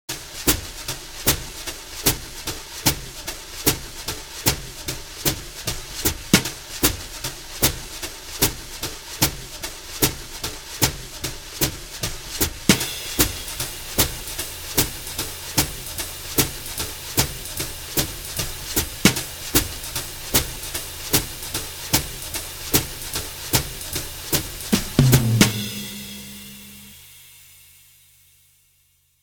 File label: BRUSHES GROOVE; midtempo